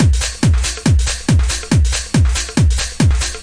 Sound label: 140 bpm bingo bongo, drum loops and breaks